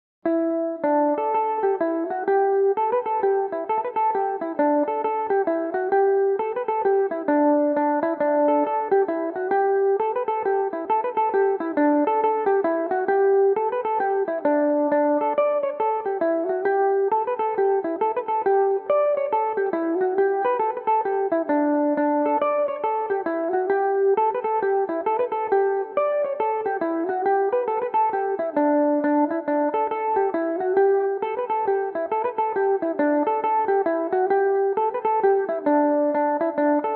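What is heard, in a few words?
slide D major belmuse, irish mandolin; johnny mickeys slide no 2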